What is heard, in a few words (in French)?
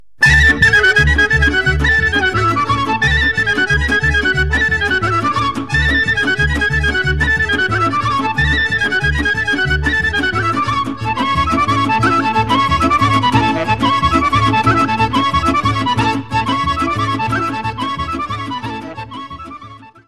Kolo, Serbie